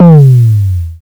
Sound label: TOM, TRASH KIT; Drums Dance & Groove; USB Soundscan vol.11; AKAI